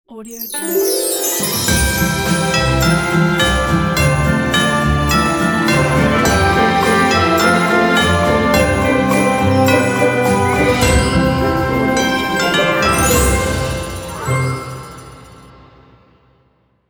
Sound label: Christmas Logo